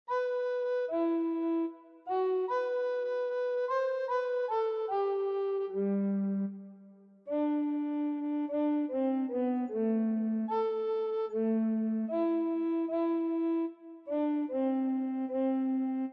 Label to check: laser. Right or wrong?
Wrong